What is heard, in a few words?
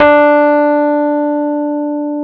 D 3, Electric Pianos; Wizoo